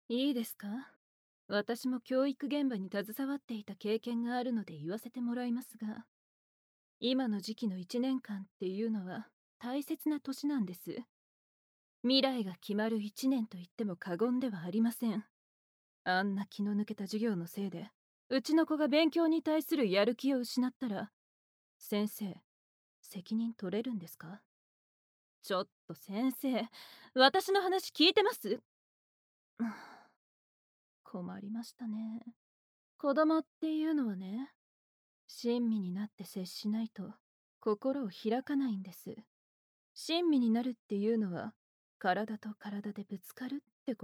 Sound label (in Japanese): 強引キスに耳舐め耳囁き, ASMR; 欲求不満巨乳ママのセクハラ逆授業！